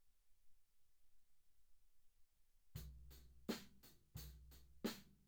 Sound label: Floor